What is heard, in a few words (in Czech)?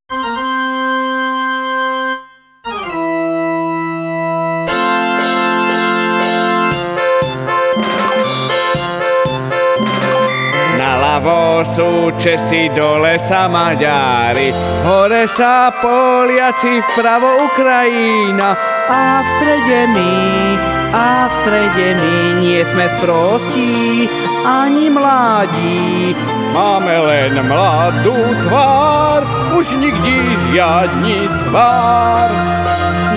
hymna